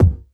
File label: bass drum, Drum & Bass